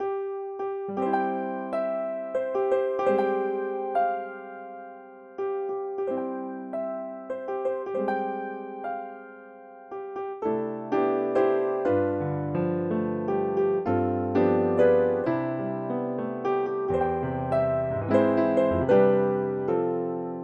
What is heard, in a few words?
birthday jazz, piano; Happy Birthday